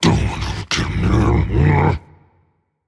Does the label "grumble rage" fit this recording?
Yes